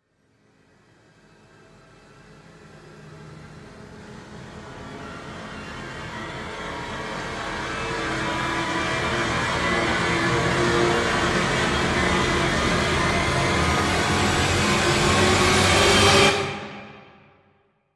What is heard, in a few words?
riser trailer song